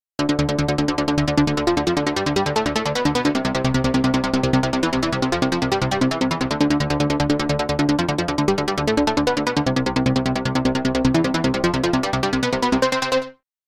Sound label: Magic Cracker Bass Line Mono